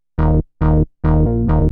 bass C; Techno; TechnoMusicMaker

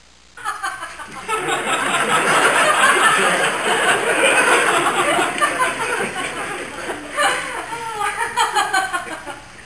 Adult Crowd Laughs; Soundsation; Human

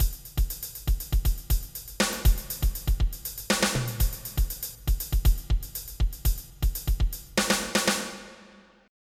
funk

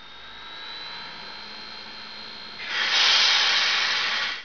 piston; Gunlok

